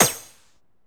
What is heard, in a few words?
SE GAME GOOD; maimai